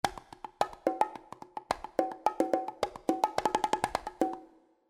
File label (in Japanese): 8 ボンゴ ソロ